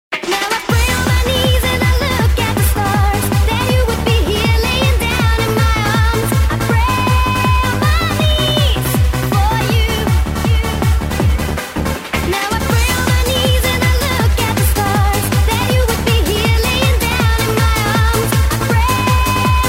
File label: Dance Song